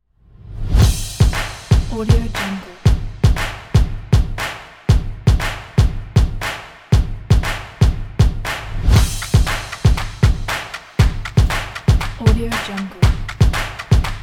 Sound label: intro opener percussion; migermusic